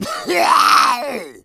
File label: smoker spot prey; voice, idle